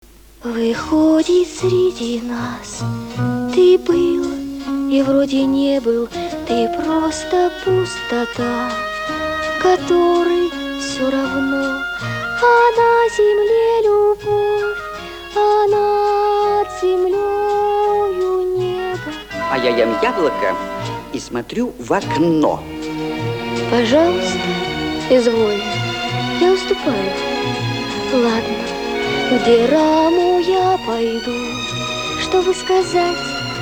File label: duet